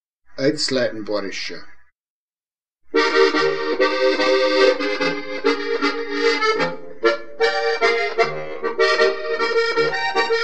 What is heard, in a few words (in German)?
Boarischer